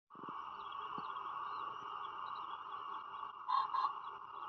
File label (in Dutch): fazant, animal sounds